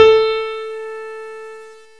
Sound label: Piano A4; bgm synthesizer